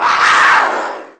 tigerlike; Weird and Interesting Hits; single hits

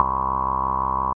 filtery synth bass; SYNTH KEYS

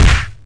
right cross, Kung Fu; Kung Fu Construction Kit

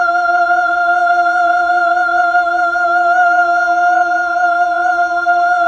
Fem F4, Mellotron Female Choir

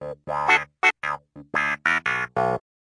clav; Instruments

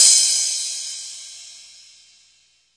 thin crash, DRUMS (SINGLE HITS), Crashes

